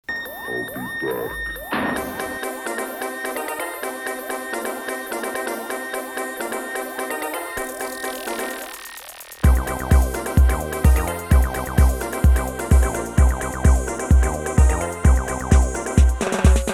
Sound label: techno intro (long)